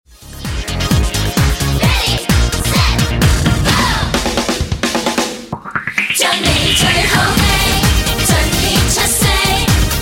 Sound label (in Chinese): Karaoke, 正向心理兒歌